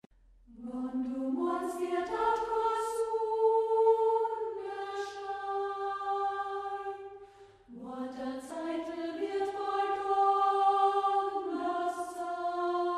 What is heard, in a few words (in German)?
Wånn du moanst